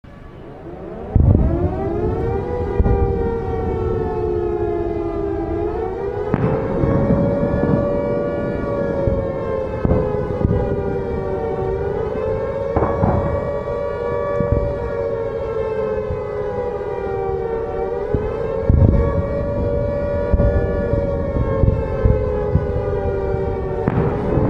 Air Raid Siren with battlefield sound in the background; alarms